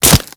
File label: Kubrow Melee Impact Flesh